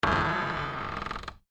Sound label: Door Creak Wood, Foley, Door, Creak, Wood; BlastWave